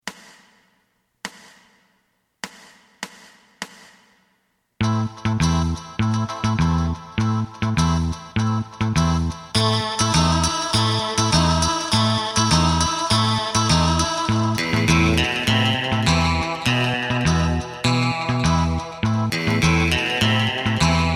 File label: drum